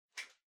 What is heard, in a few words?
Power Strip Far Hand Hard Unplug